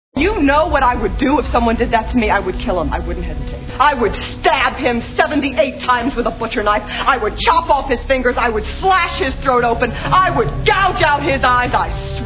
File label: 78 stabs